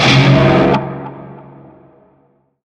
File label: Shawzin Hirajoshi Chord; Warframe; Narmer Shawzin